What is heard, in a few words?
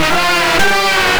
Back Effect Sect, Effected Horn Section, Jazz; Sonic Foundry (Sony Creative Software); Jazz Solos and Sections